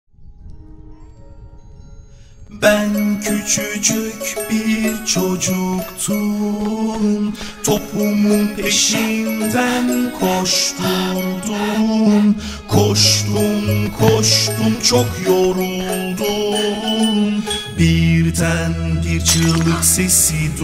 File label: theme song easy piano tutorial; Angry Birds